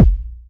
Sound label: warm sounding kick f sharp key; kick drum samples